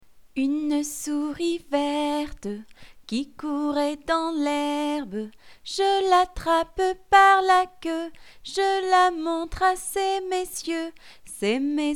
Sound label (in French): Une souris verte chant